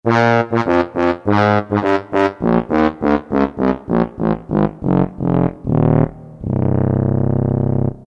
TUBA; Orchestral Winds; Roland SN-U110-06

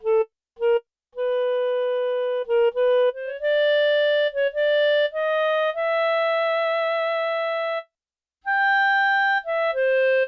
B Clarinet&Clarinet; Yamaha TX16W

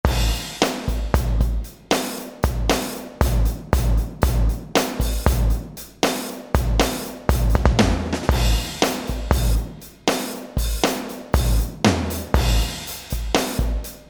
All Beef KIT, Roland SRX-01 Dynamic Drum Kits

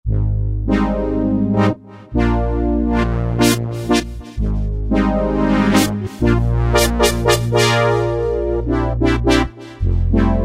Analog Brass 2, Roland JD-990; Factory Patches

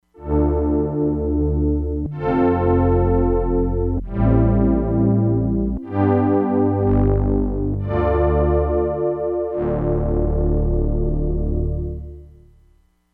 MELLOW STRINGS, Crumar Bit 99; Factory Programs

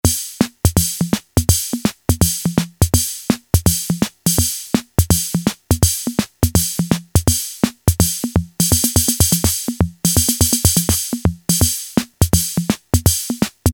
latin swing, Casio MT-68 rhythm

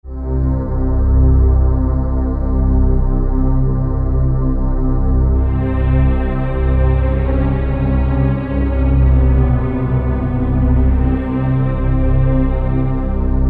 Power, Yamaha TG500

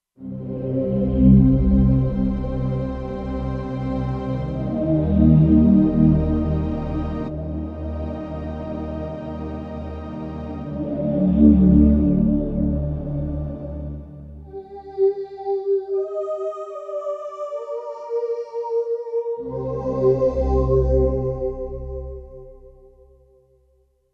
Kurzweil K2500X; Factory Setups

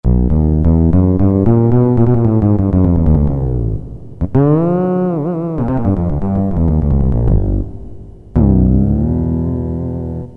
Fretless, Roland MT-32

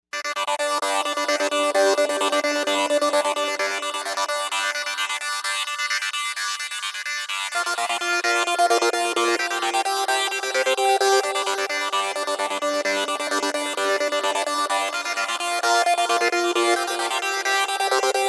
Trance; Novation UltraNova; Factory Patches

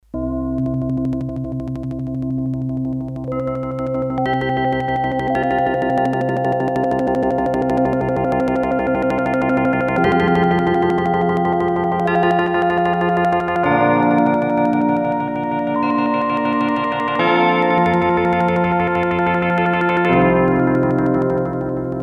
Synth, Korg DS-8; Factory Patches

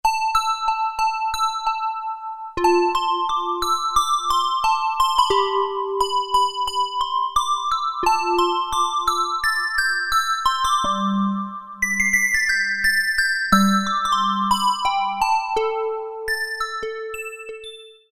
Bells, Roland MKS-50; Factory examples

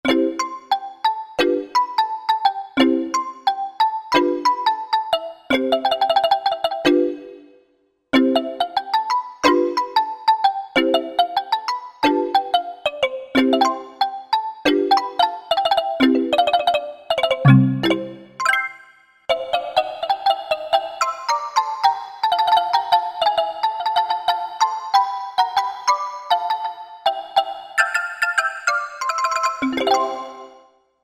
Mambo Marimba, Korg Wavestation A-D

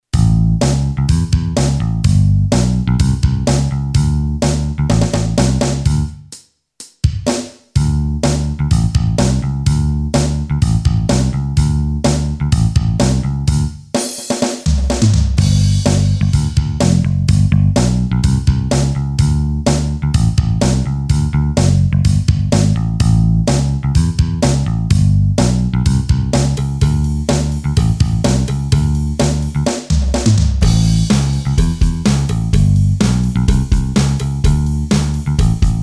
ROCK BLUES, Boss DR-3

